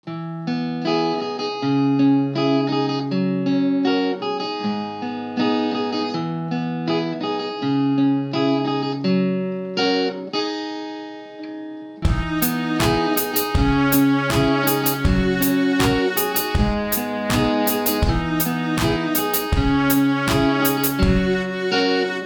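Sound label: Guitar violin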